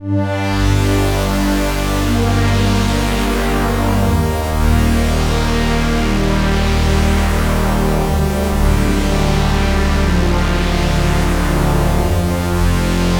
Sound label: Synthi Drone; straightliner